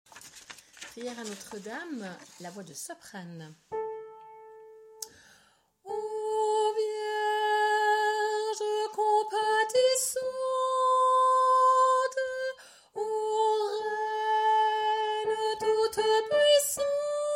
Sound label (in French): Priere soprani; Priere ND soprani